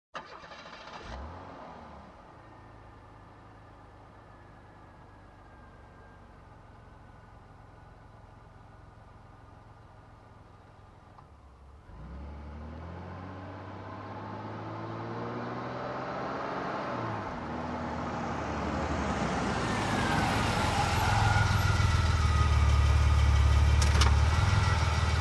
Car approaching and parking nearby

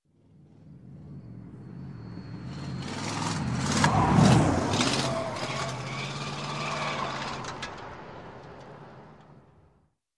Tuned Truck driving by

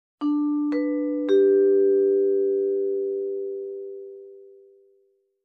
Simple vibraphone jingle melody

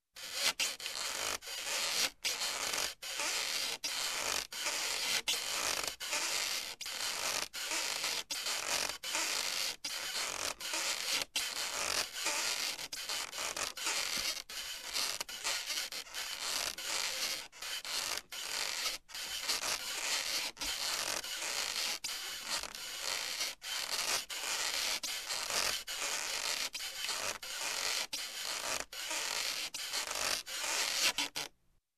Rope Squeak

